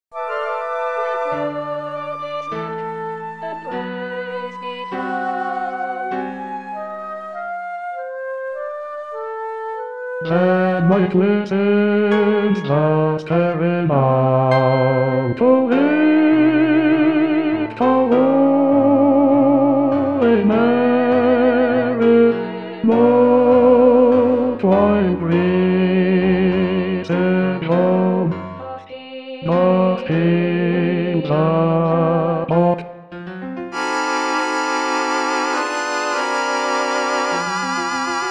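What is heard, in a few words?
When icicles Bass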